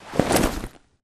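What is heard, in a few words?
grunt movement foley loud long; Titanfall 2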